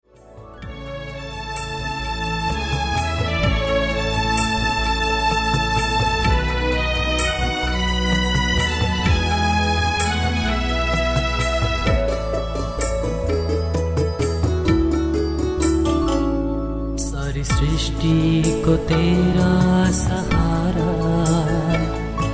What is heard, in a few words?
Bhajan